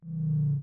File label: menu Close; starwars; episode vii the force awakens